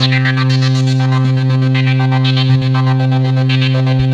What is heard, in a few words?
TECHNO FX, JV-1080